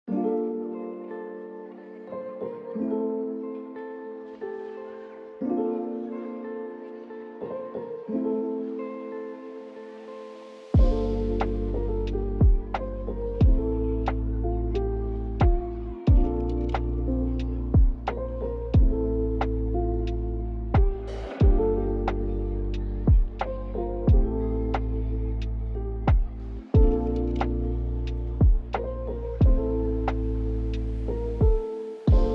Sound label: Piano Ponderings, Lo-fi